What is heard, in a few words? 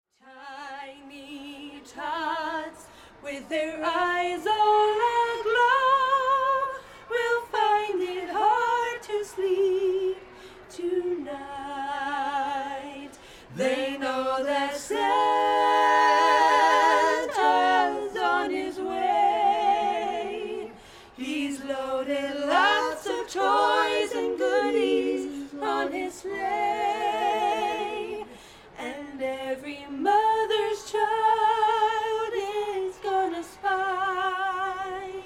Carolers Christmas song